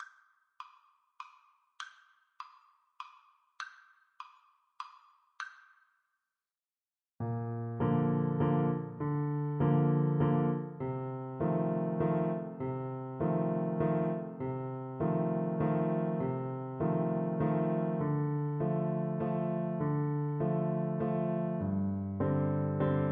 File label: skaters waltz, trumpet; waldteufel skaters waltz